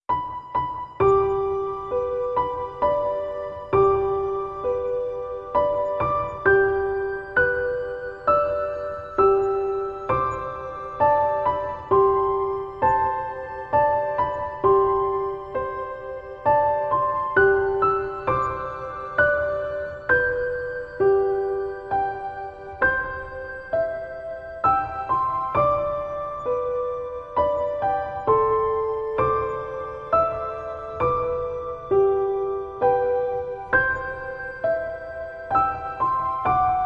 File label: Piano, Lullaby